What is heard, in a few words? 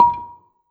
Gambang Soft Beater, Gamelan